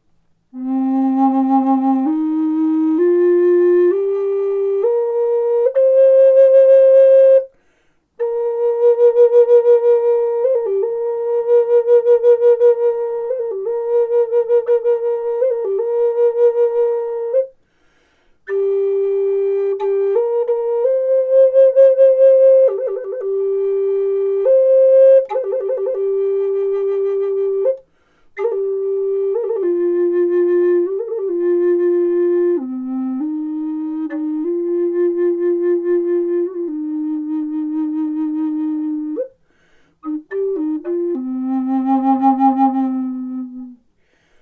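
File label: natural, flute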